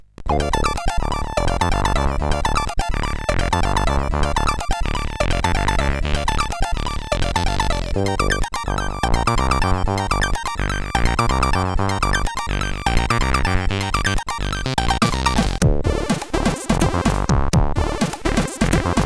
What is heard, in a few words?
Idiotism, CSG8580R5